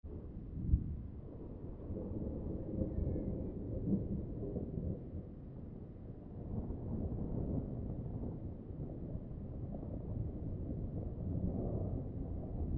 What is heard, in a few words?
wind